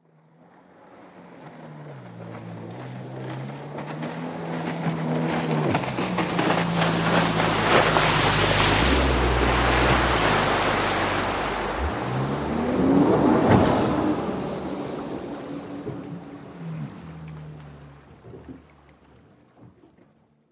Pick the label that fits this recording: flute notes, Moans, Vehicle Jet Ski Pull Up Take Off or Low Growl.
Vehicle Jet Ski Pull Up Take Off